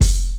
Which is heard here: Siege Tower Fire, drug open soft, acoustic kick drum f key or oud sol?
acoustic kick drum f key